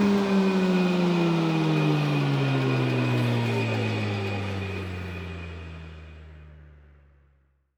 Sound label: Aircrafts A-10 Cockpit Inverter Out; DCS